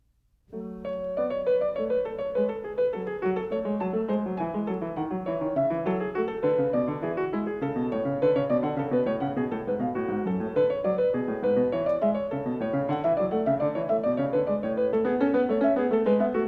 Prelude No. 14 in F-sharp minor, Bach, Well-Tempered Clavier; BWV859